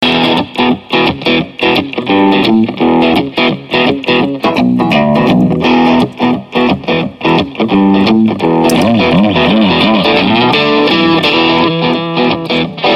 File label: Bluesrock Guitar